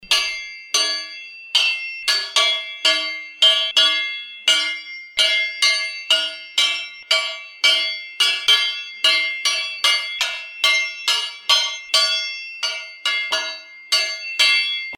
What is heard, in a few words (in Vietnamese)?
Tieng tho ren danh sat